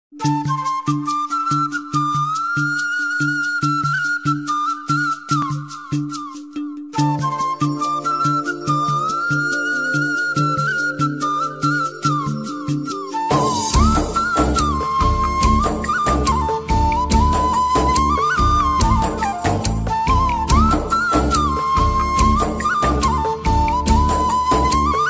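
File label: flute music ringtone; pournami movie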